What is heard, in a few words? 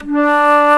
FLUTE D3; E-MU Formula 4000 Series Vol. 5; Protozoa; Proteus2 Instrmt